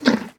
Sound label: eat, horse; minecraft; mob